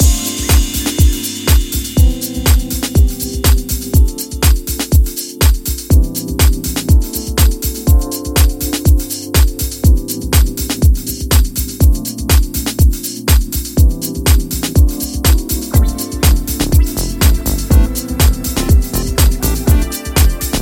instrumental

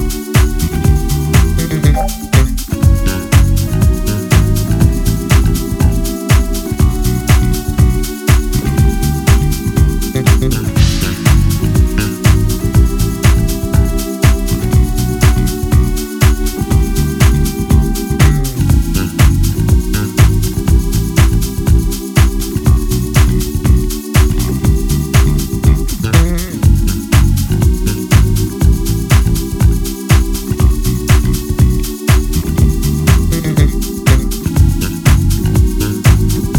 Vamp Instrumental Mix

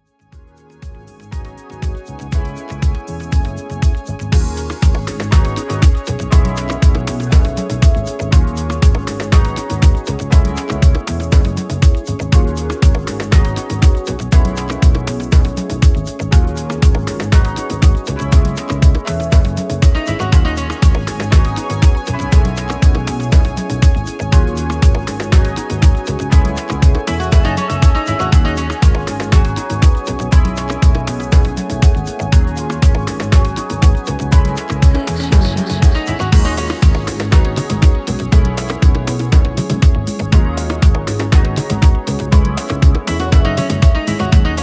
Instrumental mix

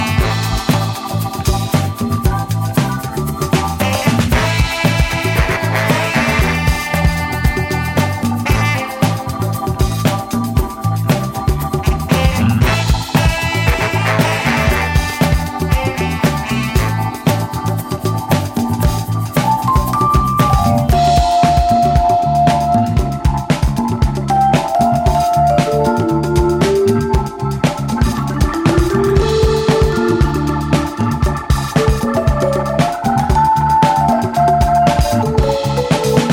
wild saxaphone

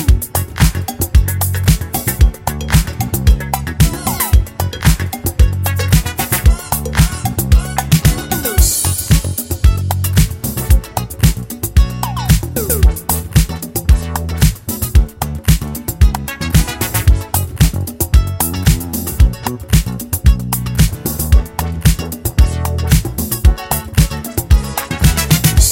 INSTRUMENTAL

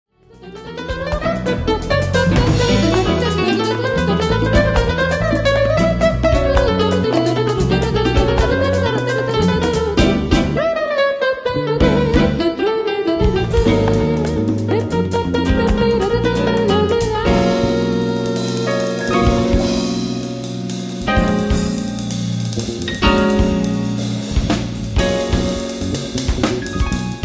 Vinyl; Sonar

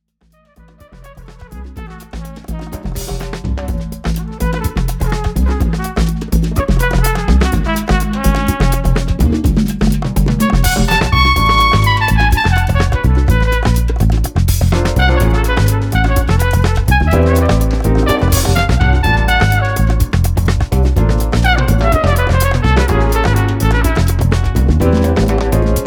Sound Of The Drum

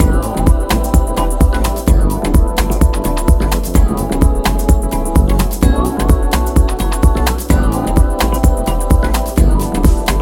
Afro House Instrumental Mix

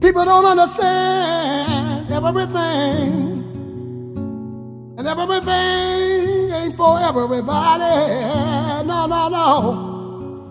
Acoustic

